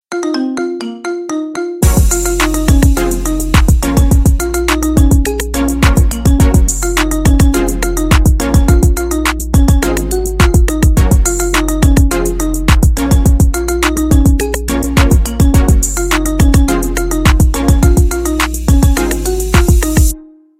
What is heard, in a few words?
marimba remix